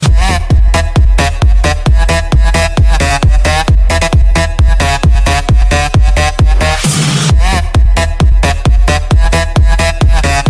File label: party party party ringtone